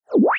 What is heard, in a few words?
Drip up